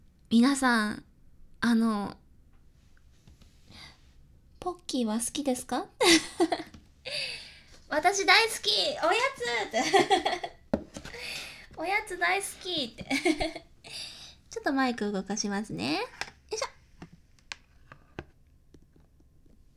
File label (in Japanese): ASMR ポッキー バイノーラル